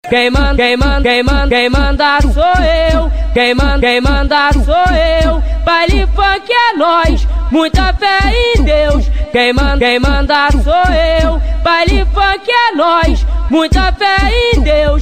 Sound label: Brazilian Funk